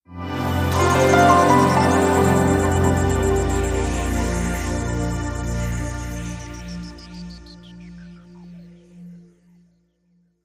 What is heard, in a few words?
Light n Smooth Ident